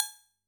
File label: Perc, drum